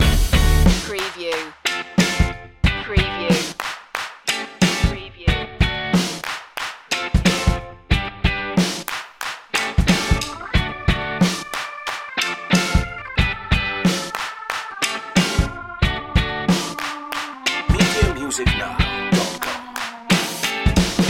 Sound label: slam the door loop